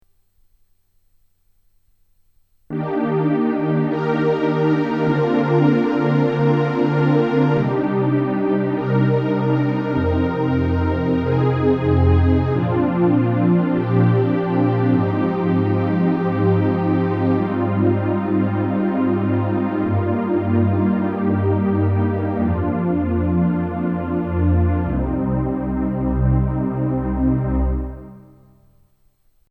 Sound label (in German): DP33 Syn Streicher